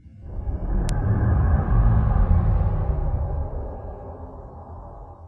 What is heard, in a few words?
decloak, klingon